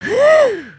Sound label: fart